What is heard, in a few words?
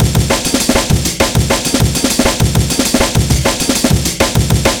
break 199.900 bpm